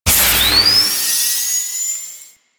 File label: Mine Activate; Warframe; Veilbreaker; Sprag